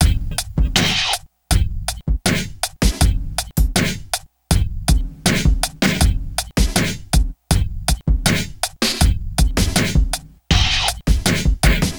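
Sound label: Loop, beats; Sonic Foundry (Sony Creative Software); Esoterik Beatz; Quesy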